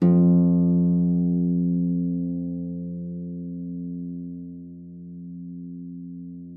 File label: HARP FORTE E2; Xsample VOL-8